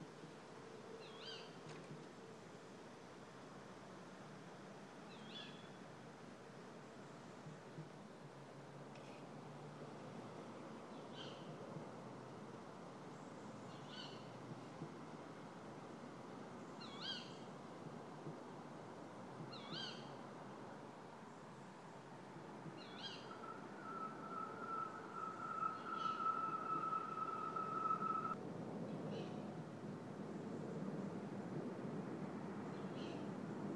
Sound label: female tawny owl; heard just now in n4